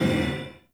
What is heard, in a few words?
Scrap Container Dome Out; Warframe; Missions; Zariman; Zariman Assassinate